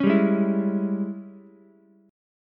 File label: G sharp Major 7th Suspended 4th Sharp 5th, Ukulele; 6,7,4,7; JGuitar